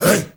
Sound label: HEY HEY